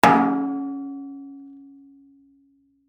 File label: Impact Metal Tank, Water, Heater, Hit; Crashes; BlastWave